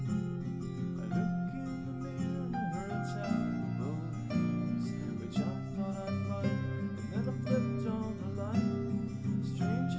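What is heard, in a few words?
nodrums live recording 5cm mix; SASSEC07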